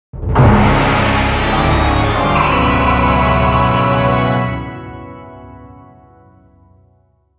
Defeat; culdcept revolt original soundtrack dynamix 2017

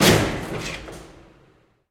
Pilon High Impact

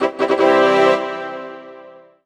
Settlement Captured; Shattered Throne

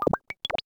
Fish; GONNER

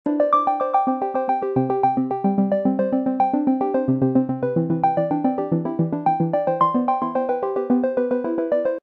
Synthline C 110; asplosion

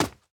Player Footstep; gamerip; escape from russia mobilization

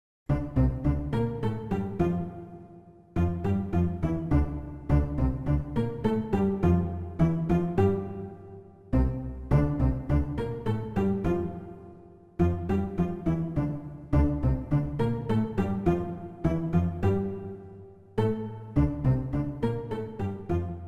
Goblin Camp, mt-32; quest for glory so you want to be a hero mt-32 ms-dos gamerip 1989

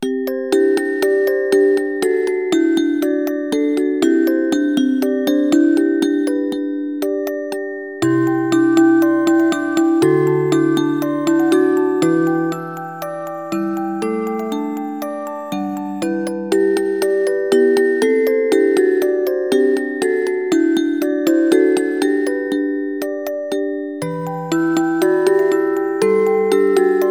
Music Box Mix; PS2

